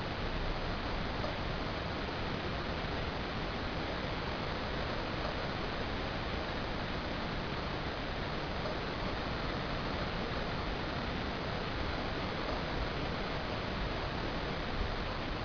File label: periodic haddock or cod